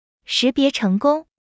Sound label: success